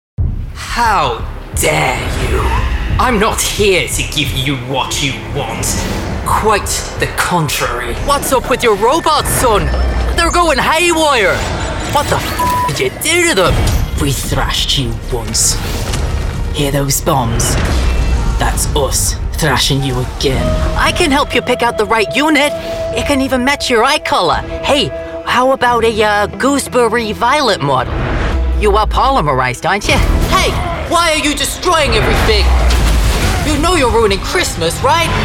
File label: video game